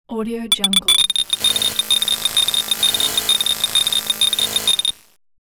sparking lamp bulb

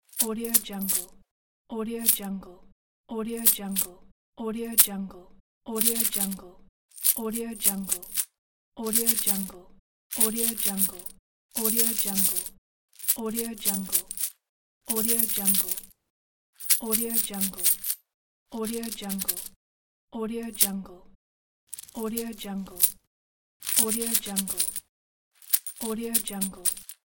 coin shaking in the wallet; warpjumper

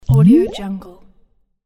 water bubble

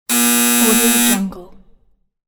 wrong answer buzzer

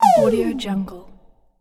scifi laser shot

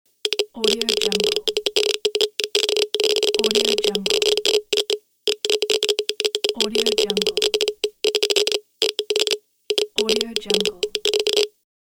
geiger counter radiation

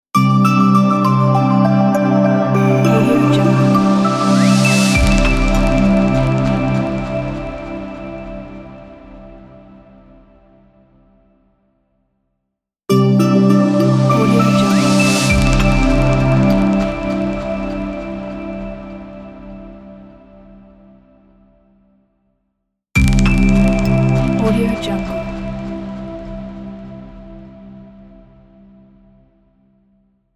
elegant ident

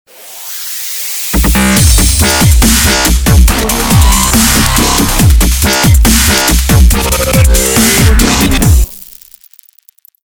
dubstep extreme logo

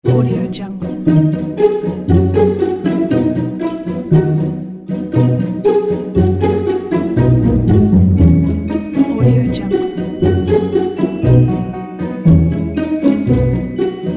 happy holiday pizzicato; shopofmusic

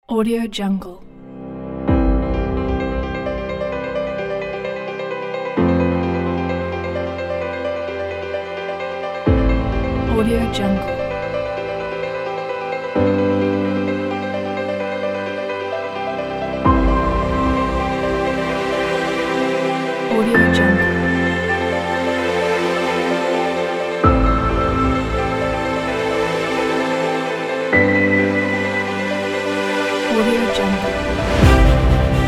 motivational cinematic background

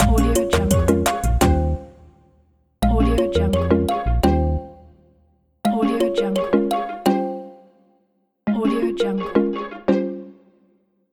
bright logo

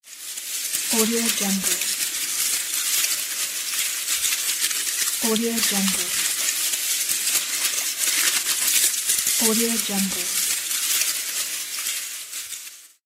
leaves rustle

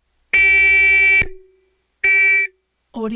1963 porsche; prosoundfx

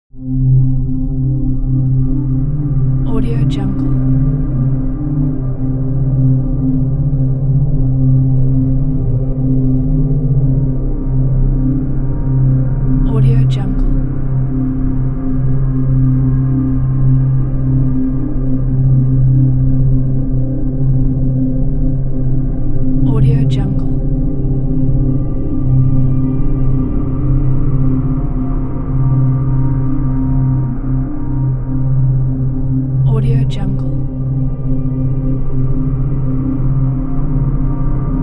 atmo drone empty nest; smartsoundfx

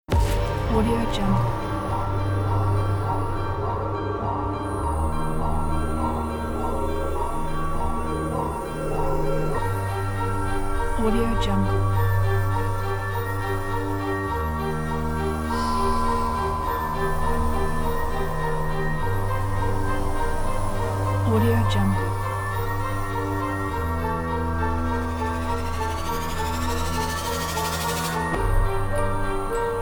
eerie suspense

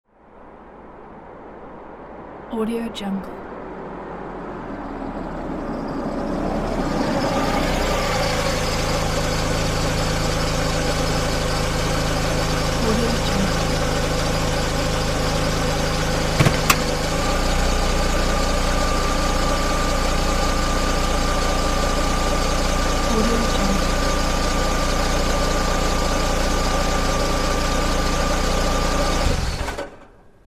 car pulls up; palmirasoundstudio

